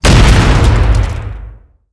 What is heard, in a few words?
Drone Explosion; Decagram Ex; blue archive